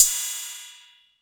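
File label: TR606 Cymbal, Roland TR-606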